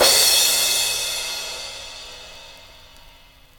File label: drum crash d key